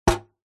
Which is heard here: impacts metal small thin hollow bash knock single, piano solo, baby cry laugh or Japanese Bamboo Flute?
impacts metal small thin hollow bash knock single